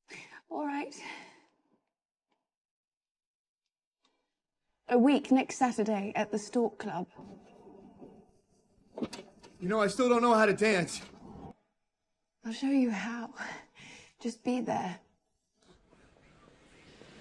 vocals

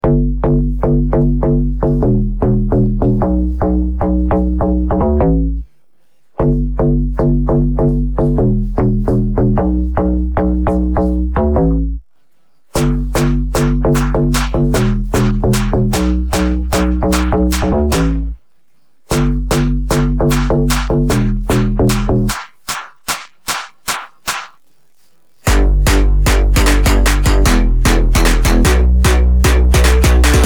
no vocals

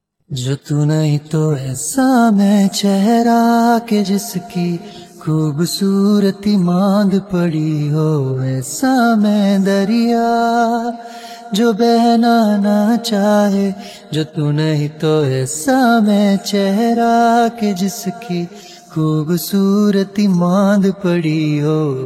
Acoustic cover vocals

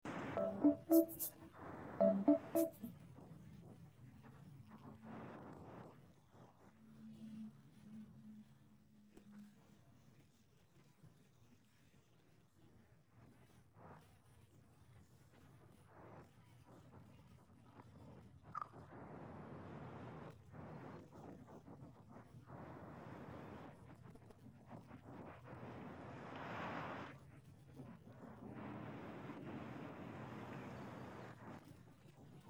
no vocals

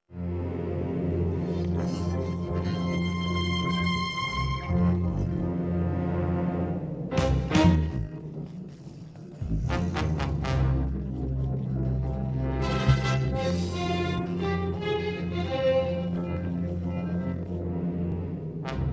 no vocals

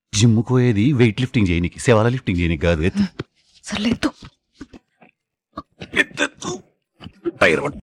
happy vocals

